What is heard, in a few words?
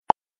pop